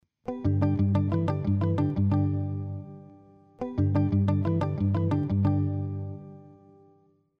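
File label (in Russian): гитара маримба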